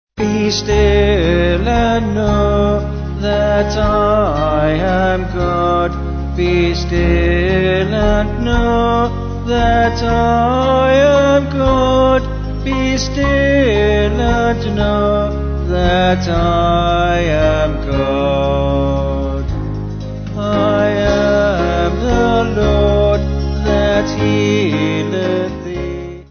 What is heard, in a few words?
Be Still And Know Vocals Band